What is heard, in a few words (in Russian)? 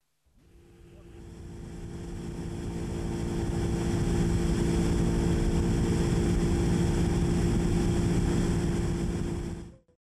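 мимо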